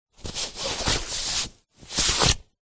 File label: foley mask fabric sleep eye on then remove; zapsplat